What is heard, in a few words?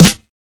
crispy steel snare drum sound E key